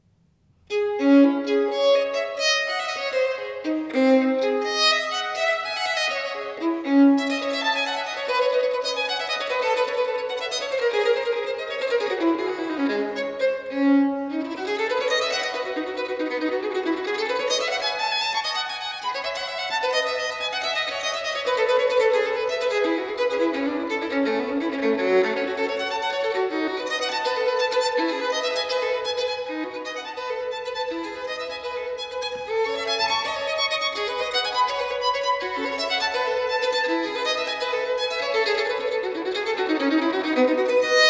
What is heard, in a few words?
Partita in D Minor, Violin Solo; Partita nr.2; BWV 1004; Bach; Sonatas & Partitas for Violin Solo